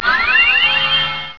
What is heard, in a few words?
Lap Squeek; Total Distortion